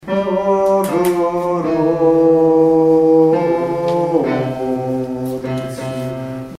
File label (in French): basse; Dostoino iest; mes 7-9